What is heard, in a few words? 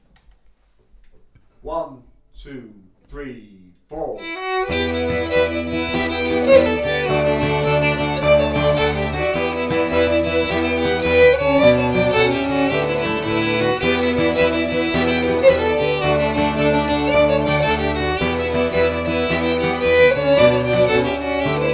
Swallowtail Jig full speed